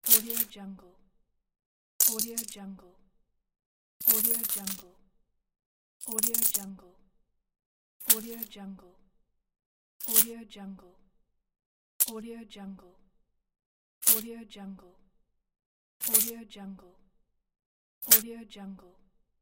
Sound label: coin sound effects pack